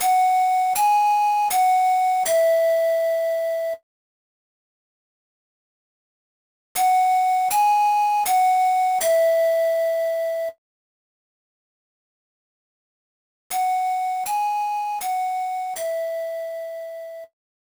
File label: Spirit Flute Practice; the legend of zelda spirit tracks